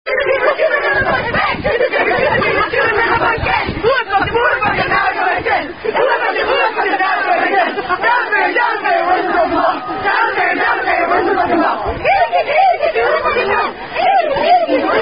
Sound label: Rugby Songs, Rugby Cante Fable; Uwec Alumni Rugby Songs